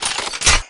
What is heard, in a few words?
Weapons Rocket Launcher